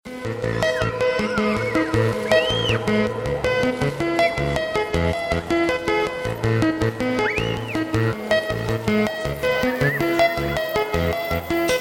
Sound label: organic ambience and textures